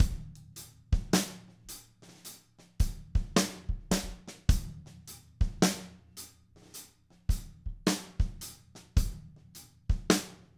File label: 107 SLOW GRV, Drumscores; Steve Gadd Drumscores; LO BPM